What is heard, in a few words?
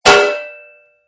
Impact Metal; Metal impact. Hit metal or anvil with hammer or other metal object.